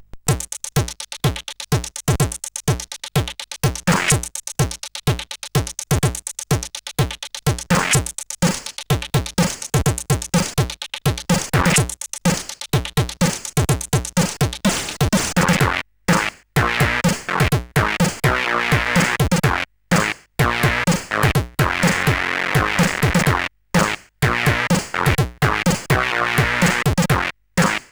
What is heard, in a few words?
Heavy Introtune; CSG8580R5